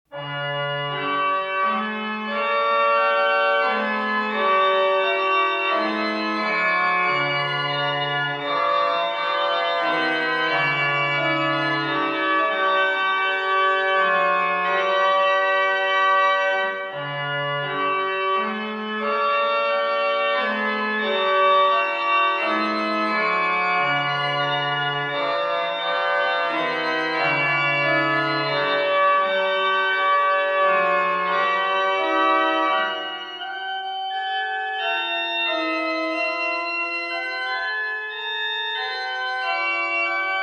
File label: Chorale Prelude; Bender; Three Short Chorale Preludes; No. 3 If Thou But Suffer God to Guide Thee